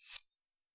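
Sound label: jungle kit reverse tambourine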